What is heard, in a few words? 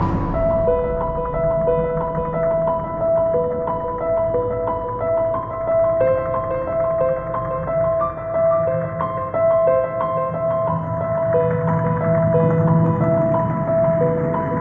Underscore; Zombie Blops; Theatre